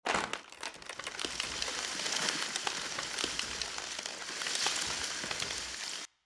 tree Medium

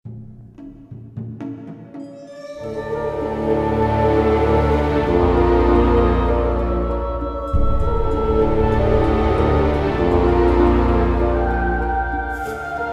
Complex Ambient

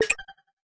Enter

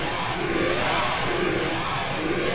zapping

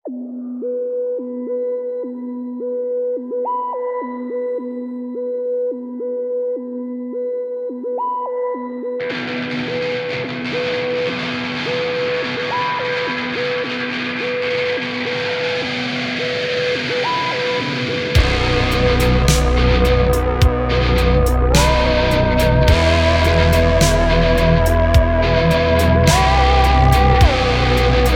Exploration Theme Music

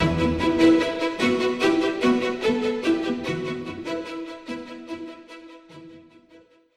sounds music; Halo: Combat Evolved; Xbox

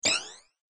Quiz Answer Correct

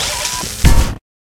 scrap electro; Risen

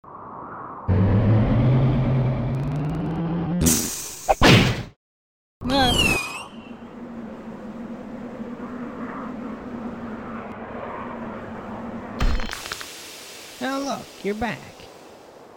Jetpack; Escaping The Prison

